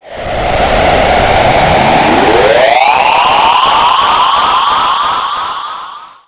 DISTART, Trance Fusion; ILIO

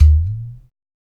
UDU, African

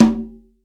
CONGA H; STEREO CONGA; Cuba

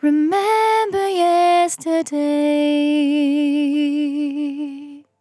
On A Star 65bpm F, Vocal; Zero-G

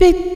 BIII VOX, ADLIB WOM, Funky Vocals; USB Soundscan vol.18

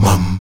VOX MUM F#2D, Afro Chants, Stacc Mums; Roland LCDP11 Africa VOL-1

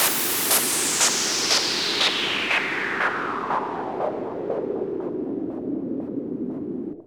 Sweep; noise

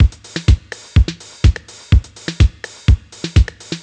drum loop verbe full 125, Classic Chicago House; Sample Magic; Drum Loops

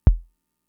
kick, Fricke MFB-522

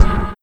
Noiz

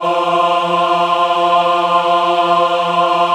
F3 MW AH, Choir; USB Soundscan vol.28; Acoustic & Synth; AKAI

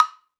Block, cartoon caper, Percussive; musicradar

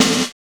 snare+hat; DRUM LIBRARY; DRUMS

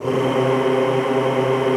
C2, Extended Classical Choir; Best Service

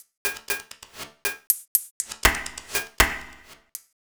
Metallic Beat, Electronic Beats; Sonic Foundry (Sony Creative Software); Myths of Technology

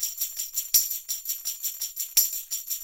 Percussionworks; Sampleheads; NYC Percussionworks; 90 s Sample CDs

